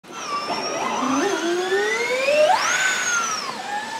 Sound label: hair dryer start